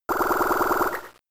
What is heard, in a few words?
Waterfall; Waterfall part 1